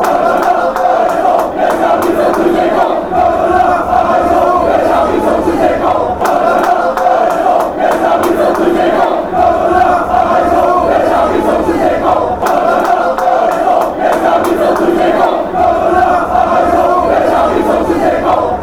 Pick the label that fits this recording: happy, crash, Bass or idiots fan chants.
idiots fan chants